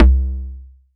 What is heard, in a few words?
Kick, Drums; Bitkits